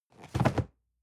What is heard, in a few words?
cardboard box medium fall low; objekte